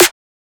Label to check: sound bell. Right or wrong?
Wrong